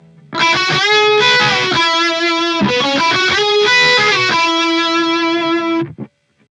mesa 単音

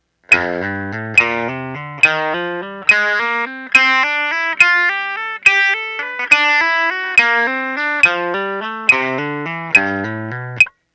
ハンマリング練習

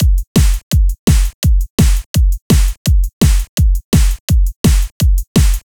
リズムパターン

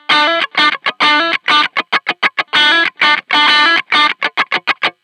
カッティングギター

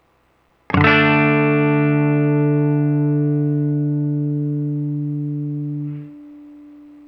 パワーコード

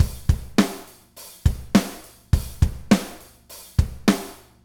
ゴーストノート

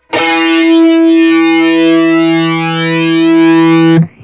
フランジャー

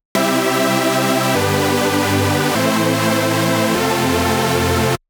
シンセ

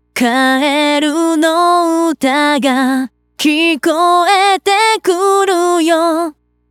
バラード; synthesizer v

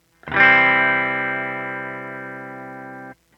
クリーンギター

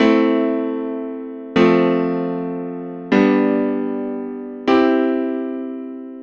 Ⅵm→Ⅳ→Ⅴ→Ⅰ進行（Am→F→G→C）

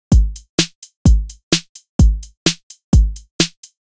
ハイハット

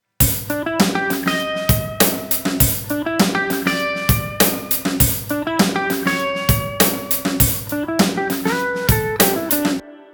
アドリブ

